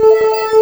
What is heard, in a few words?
DG WAVE, DIGI SYN